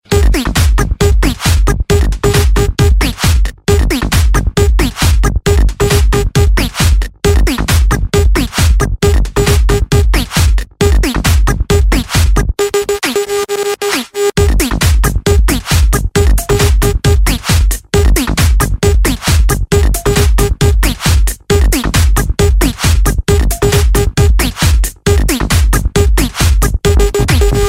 Techno Mix

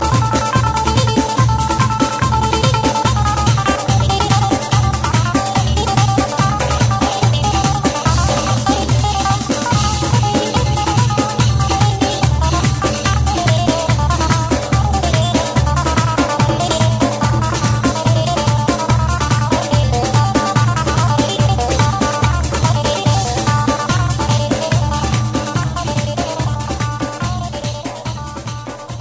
Kurdishturkish Dance

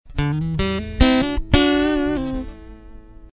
guitar

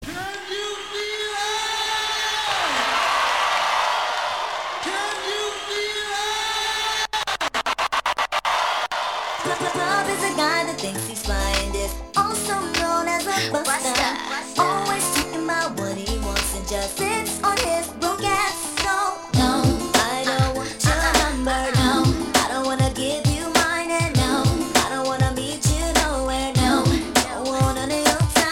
Dance Mixes

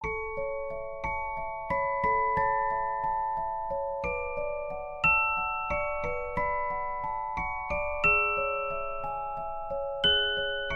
Moonlight Music Box

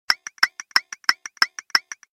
Time Ticking

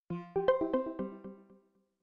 Windows 8 Tone

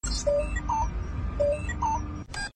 Beep

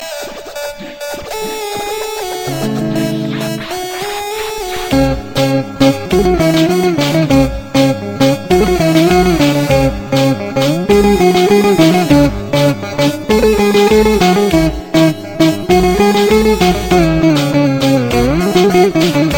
Rumba Mix

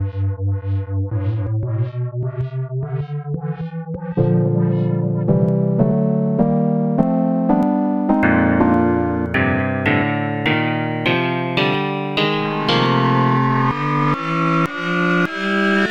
F Major Scale

